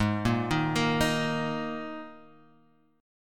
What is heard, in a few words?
Ab Suspended 2nd chord